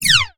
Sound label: Space Gun; 1001 Sound Effects